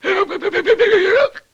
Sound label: LoFi Gurbles; Vintage Cartoon; Comedy Vocals